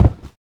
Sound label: player falling damage; mcl sounds